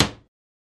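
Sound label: Hammer Nail Wood Single; hammers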